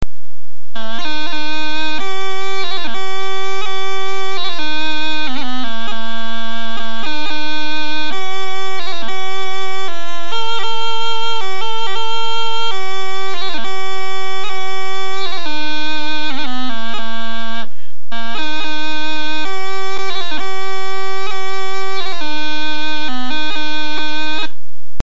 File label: chanter